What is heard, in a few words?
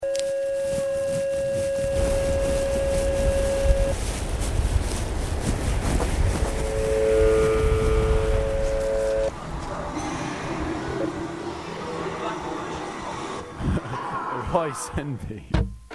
WAVE; Cornwall